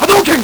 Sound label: LSDj, Nintendo Gameboy; 300 Drum Machines